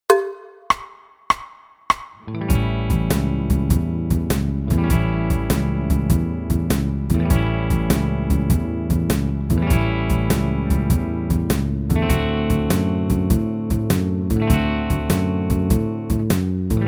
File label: Blues with 7s